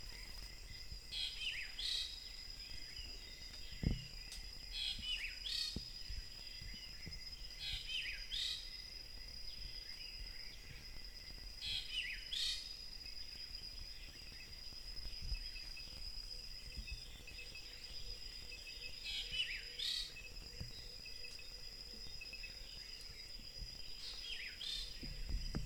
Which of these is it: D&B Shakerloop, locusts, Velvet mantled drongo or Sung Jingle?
Velvet mantled drongo